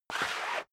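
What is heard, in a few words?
step dress boots concrete slide long, footsteps; schritte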